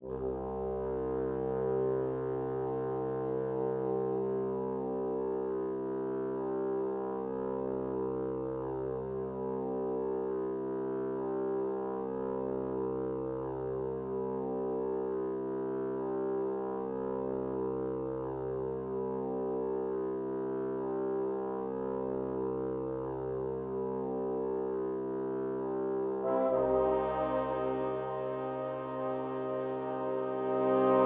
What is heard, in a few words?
Bassoons 54 bpm; Zarathustra